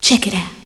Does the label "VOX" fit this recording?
Yes